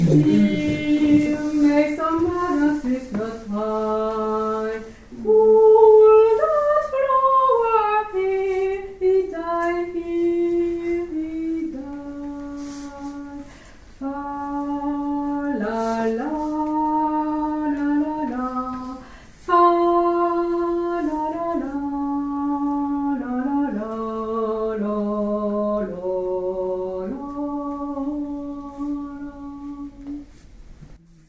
Dear may some other Hommes

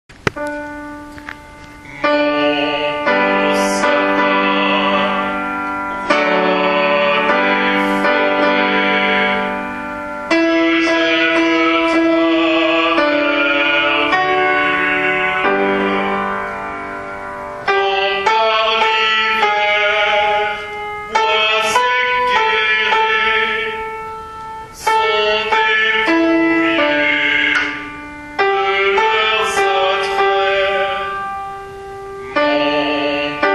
Mon beau sapin Alti

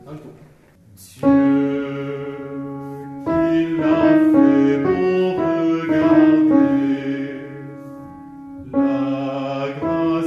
Dieu qu'il la fait bon regarder Alti

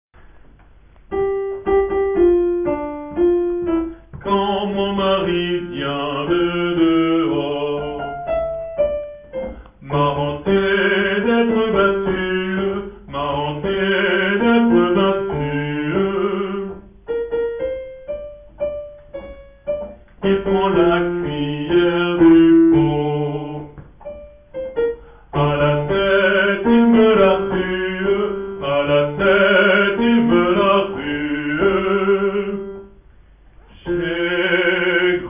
Quand mon mari Basses

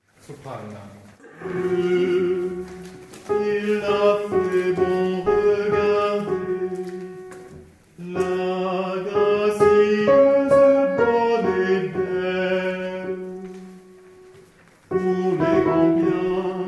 Dieu qu'il la fait bon regarder Soprani

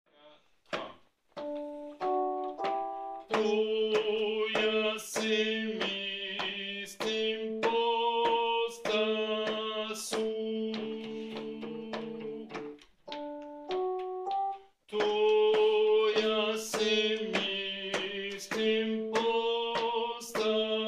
Tenors

